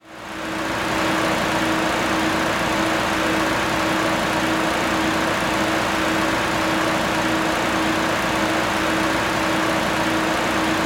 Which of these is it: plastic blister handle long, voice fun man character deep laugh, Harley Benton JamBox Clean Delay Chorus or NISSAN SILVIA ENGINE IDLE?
NISSAN SILVIA ENGINE IDLE